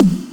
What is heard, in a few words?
kick, DnB Breakz Kit; Breaks N Beats Sound Kit